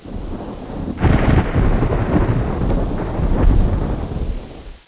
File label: thunder; quake; fortress